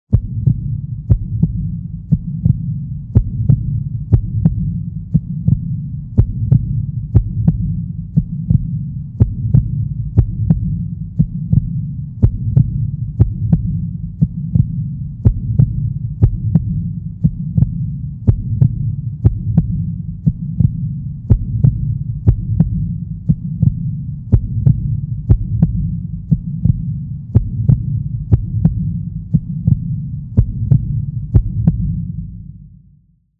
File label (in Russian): zvuk serdcebienija